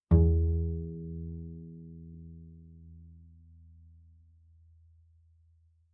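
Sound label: Bass pizzicato fortissimo sul D E2, Double Bass; MIS Pitches - 2014; Strings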